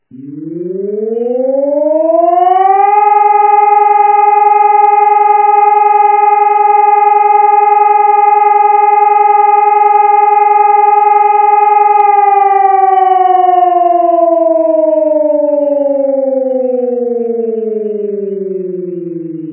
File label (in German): feuer, warnung; notfallvorsorge; information und warnung der bevoelkerung